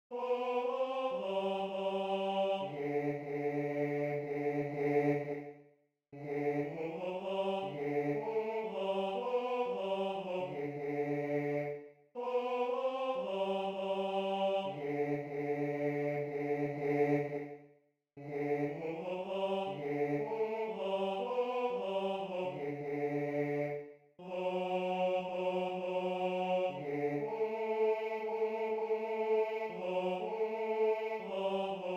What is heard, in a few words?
Bass I; Bauernmesse; 5. Sanktus